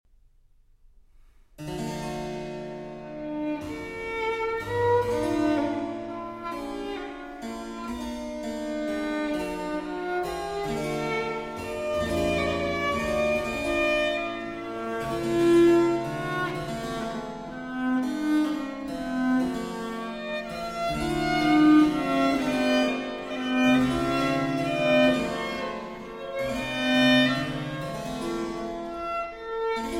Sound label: Violin Sonata in E Major, Sicilienne; 12 Violin Sonatas, Livre 2, Sonata 12